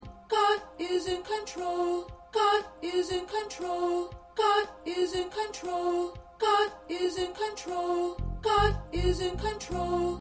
Alto, choir

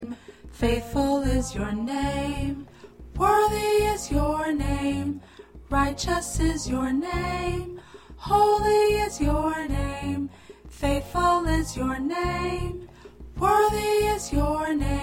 P Soprano

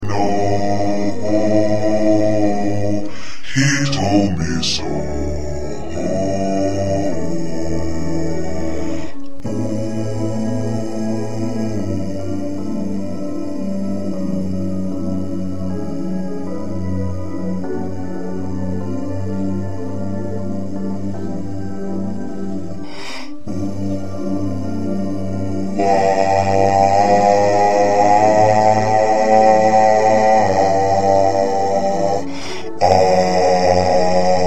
Bass

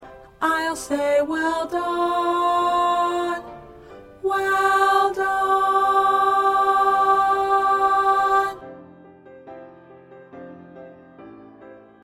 Tenor

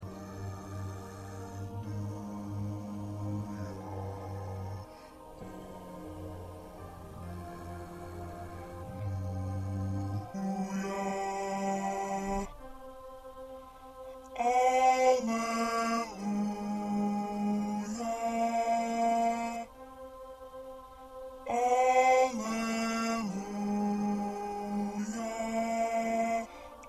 2nd Bass

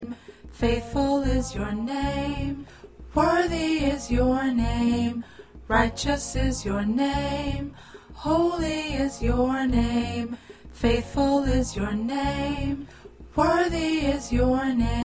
Alto

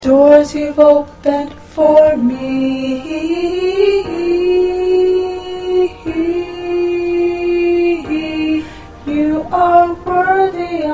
Alto, choir parts

